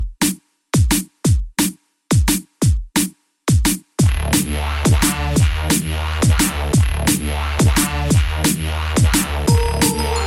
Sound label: Dubstep bells